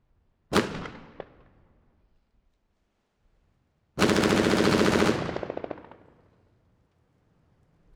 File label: GAS STATION G36 SINGLES BURST 20M FRONT PART OCCLUDED; MKH416; AUDIOBEAST; FIBUA; WEAPONS RECORDING PRIMER